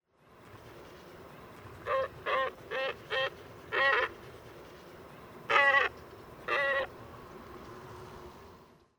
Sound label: glossy ibis